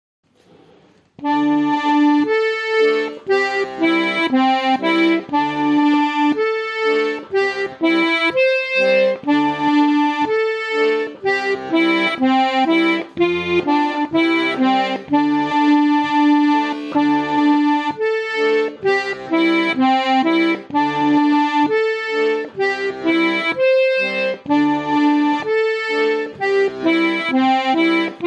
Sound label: Polka